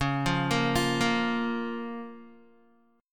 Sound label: C sharp 6th C sharp down Guitar; arpeggiated; C#6; JGuitar